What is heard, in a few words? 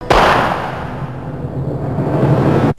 death; singe